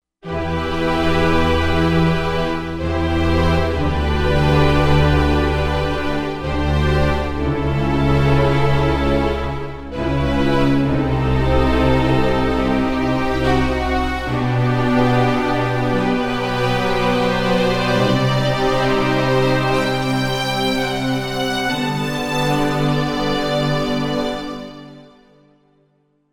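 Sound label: Strings Velo Ensmble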